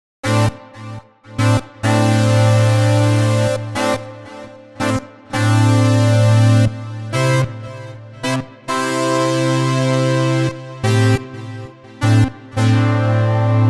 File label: Brass; Cherry Audio GX-80; The CS Brass